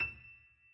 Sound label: key; keySounds